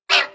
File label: Lazer Collision; Angry Birds Space